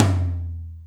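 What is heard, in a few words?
Lo D Amb, Alesis D4 drum machine tom; 300 Drum Machines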